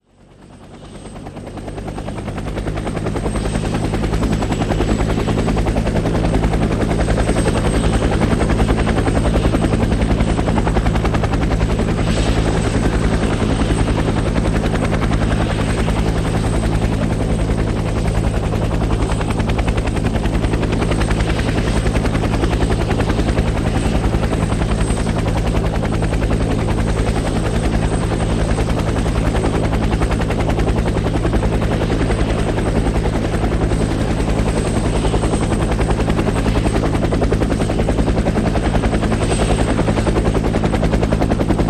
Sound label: UH-1 Huey: Exterior Hover, Medium Close-up And Away